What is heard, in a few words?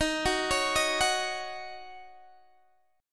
D sharp Minor 7th, Guitar, DADGAD